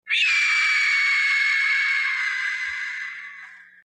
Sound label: WARHAWK SCREECH